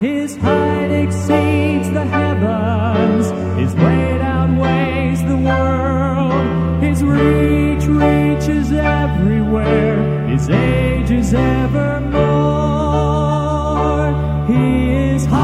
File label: Quartet